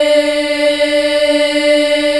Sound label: D3 child eh, Choir; USB Soundscan vol.28